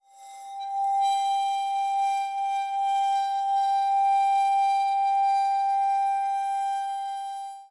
scrape glass

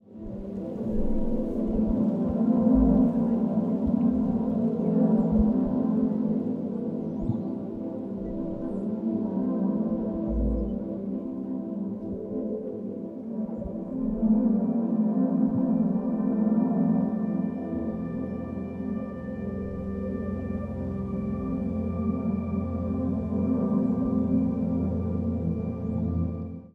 surface tension vagues; loic minty

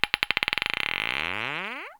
tktktk asc

